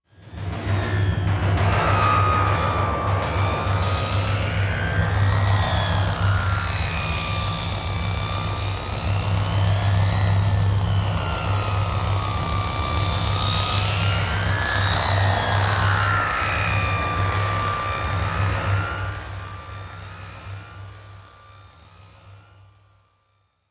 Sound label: Phobia Winch Machine, sci-fi ambience